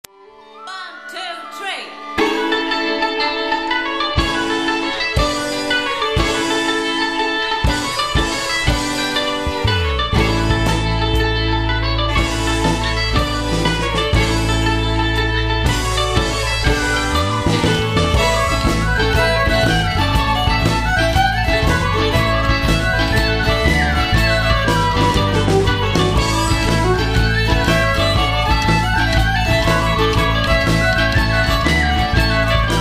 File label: Irish 1 Folk Rock